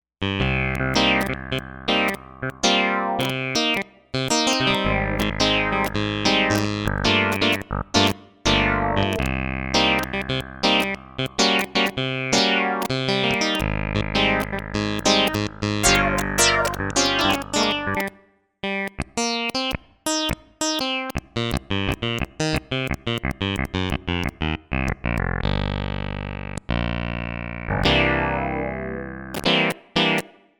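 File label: Piano Fun Key, synth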